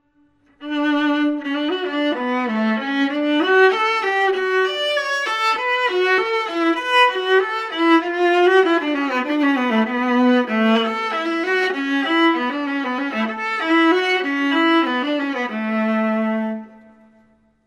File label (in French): alto